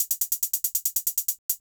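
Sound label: HIHAT ELECTRO 140, Techno Drum; Techno2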